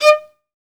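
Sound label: solo violin, strings; ILIO; Synclavier Strings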